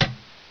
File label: knife bing